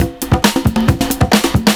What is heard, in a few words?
PERCS, 70 s Breakbeats